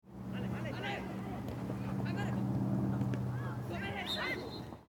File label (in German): Fussball Jungendtraining Spielszene